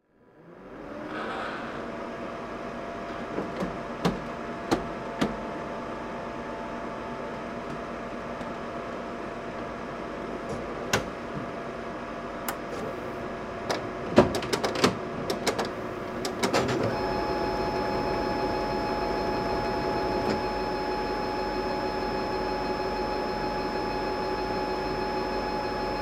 office printer hp lasterjet cp3525dn switch on initialise behind unit perspective